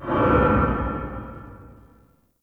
Low Mech; Ambience; Warframe; Nokko Colony

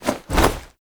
mount balloon jump; Drifter; Warframe; The New War

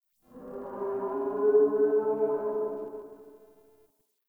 Exterior Distant Calls Scared, Ambience

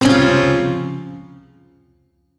Shawzin Phrygian Chord; Warframe; Duviri

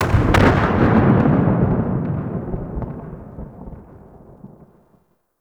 Archwing Space Explosion Dist; Warframe

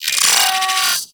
Arachnoid Micro Vocal Death; Warframe

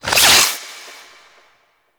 Siphon End; Warframe; Warframes; Garuda

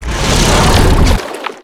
Octopede Lash Limbs Whoosh; Warframe; ManInTheWall

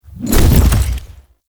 Horse Jump Barrel Roll; Vehicles; Foley Actions; Warframe; Duviri

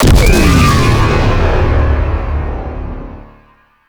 Glaive Detonate Explosion Large; Warframe; Glaive Ghost Catcher

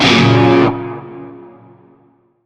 Shawzin Yo Chord F; Warframe; Narmer

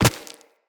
Walk Rock, Footsteps; Operator

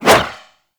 Golden Band Staff Whoosh; Warframe; Monkey King

